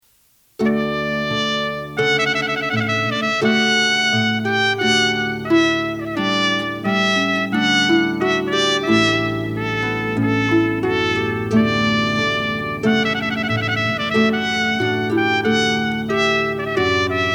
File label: trumpet